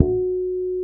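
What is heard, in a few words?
F#4, fretless harmonics, bass; Spectrasonics; Bass Legends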